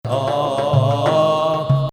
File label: Ajam; Ajam, which resembles the Western Major scale, has two distinct versions